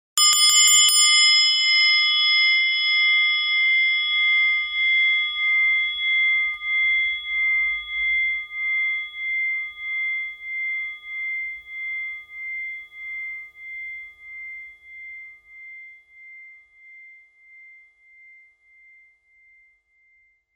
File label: bell